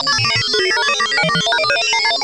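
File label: computer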